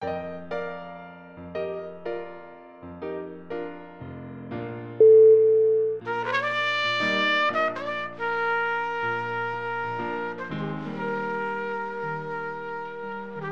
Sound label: the smooth trumpet